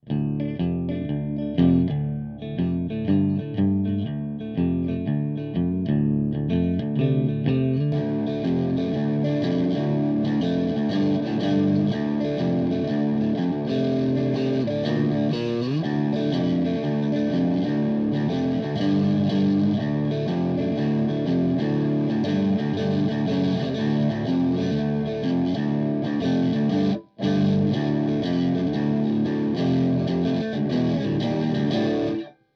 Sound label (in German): Verzerrer Pedale Tube Screamer; HB Vintage